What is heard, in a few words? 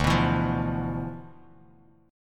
D Minor 6th Add 9th D, Bass Standard; Dm6add9 strummed; JGuitar